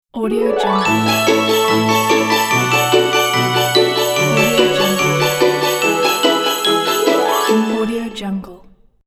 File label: christmas fun ident